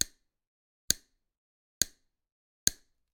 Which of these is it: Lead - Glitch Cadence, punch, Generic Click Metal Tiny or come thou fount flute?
Generic Click Metal Tiny